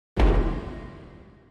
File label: alert sound